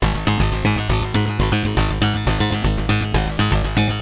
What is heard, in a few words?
raver harpsichord loop; Ambient